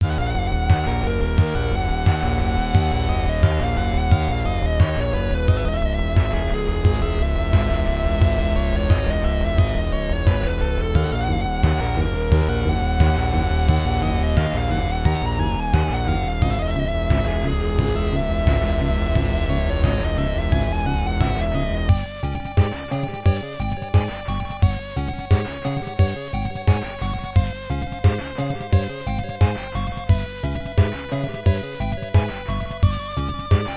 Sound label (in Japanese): 月の狂気 (FM音源版)